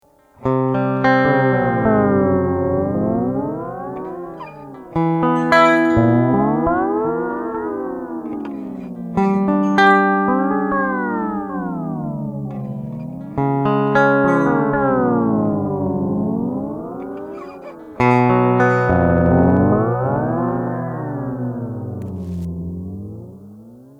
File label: Modulation Mode